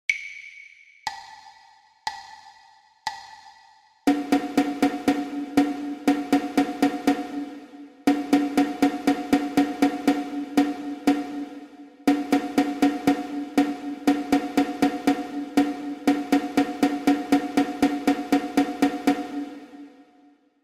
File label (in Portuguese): percepcao ditado ritmico